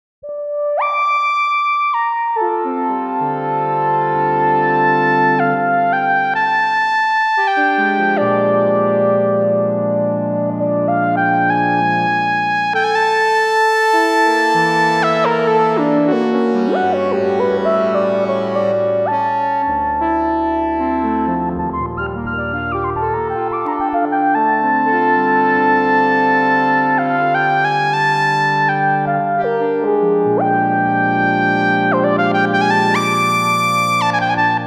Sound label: chords